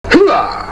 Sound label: whooa